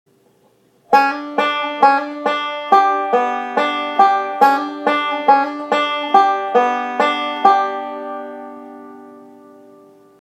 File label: lick